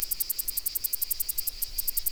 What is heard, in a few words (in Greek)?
tzitziki